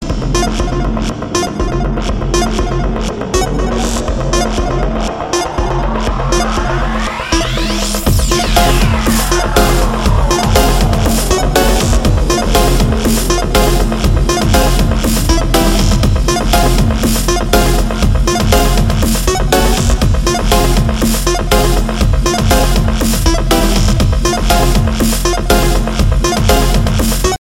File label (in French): sonnerie